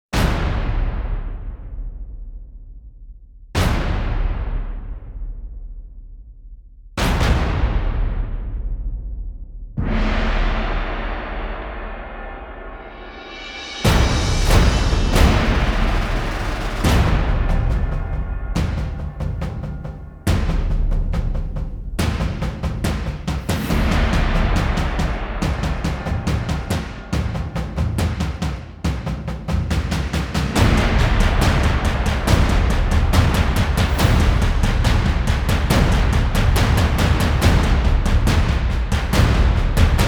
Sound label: drums build